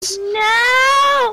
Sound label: death scream